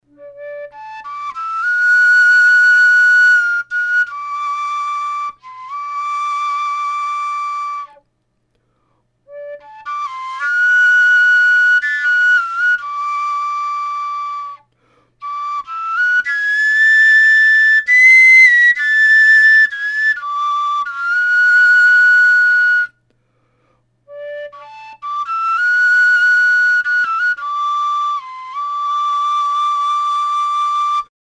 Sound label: Overtone Flute